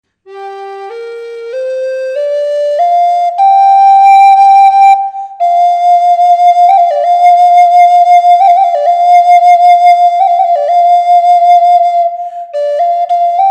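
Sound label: reverb, flute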